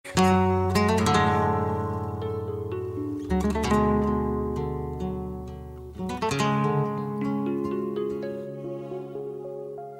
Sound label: Guitar Tune